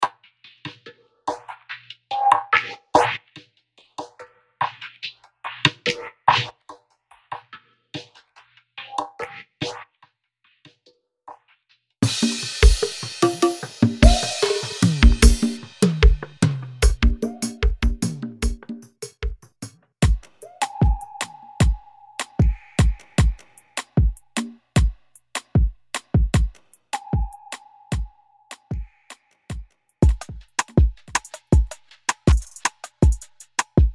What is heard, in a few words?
Afrocarib